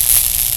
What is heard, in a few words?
moonraker draw, weapons; gesource